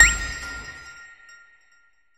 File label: lucky reel three; jewel fruits